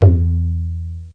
lo tabla

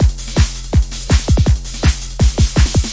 stupid trance, drum loops and breaks, 164 bpm

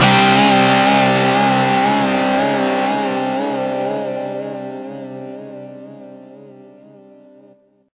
guitar bender 5ths; GUITARS

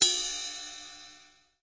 small ride

